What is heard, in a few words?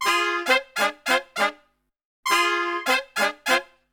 BRASIL, Brass; Best Service; Brass Super Section; BRASIL LICK